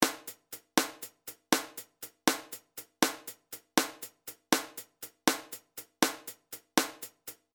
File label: beat; コンプレッサー